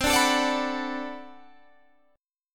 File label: B Minor 7th C, chord